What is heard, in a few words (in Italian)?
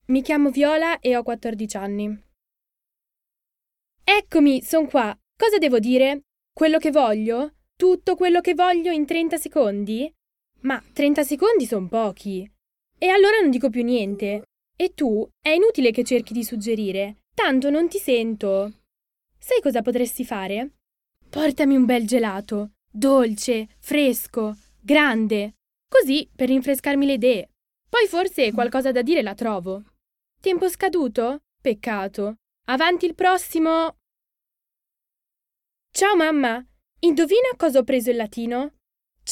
Viola